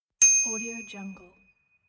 ding

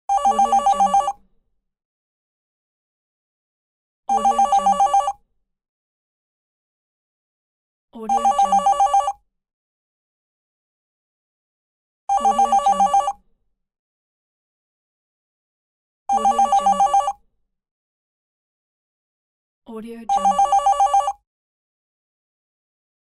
telephone pack; dellostudio

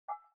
elements water dabble single glas bowl; smartsoundfx

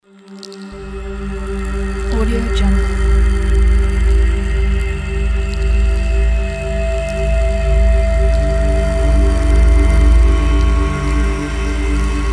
video game background music

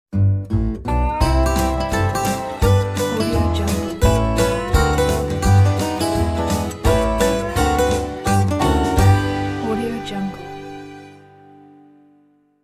acoustic western logo

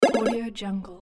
retro blip delay

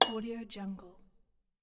small metal hit

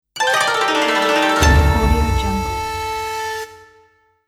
japan opening logo

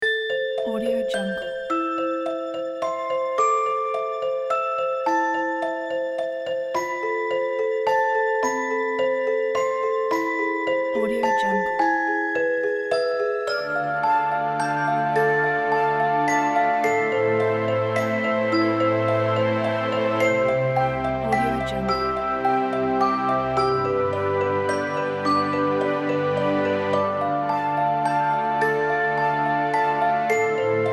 dark fairytale

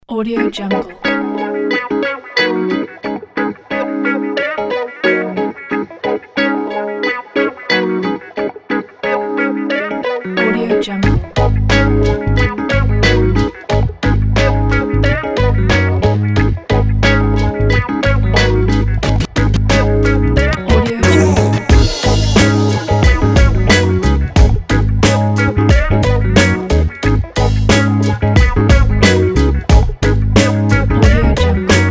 old school hip hop

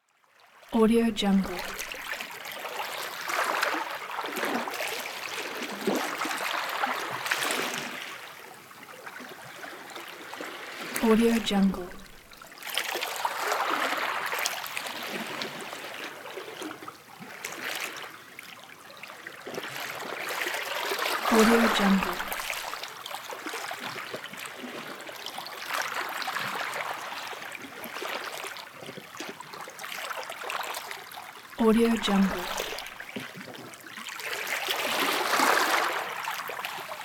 ocean waves

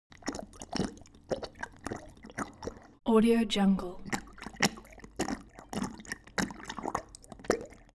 animal dog drink calm; smartsoundfx

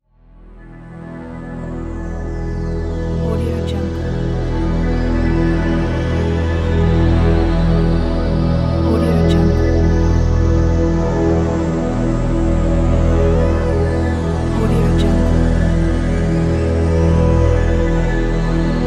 drone

